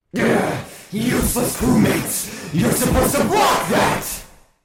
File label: stab; gasharpoon